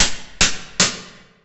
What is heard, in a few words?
Boxing Slap Stick 10 Seconds